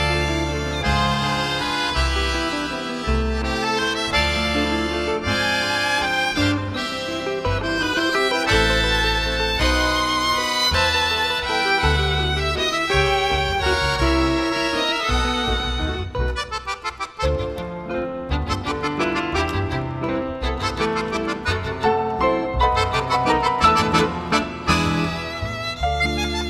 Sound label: swing a left off waltz, accordion music